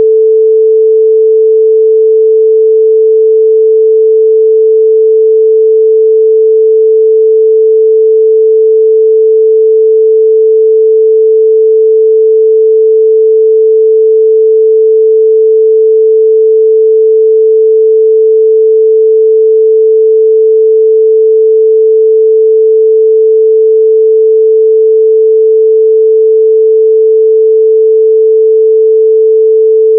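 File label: tone 440 Hz; 30s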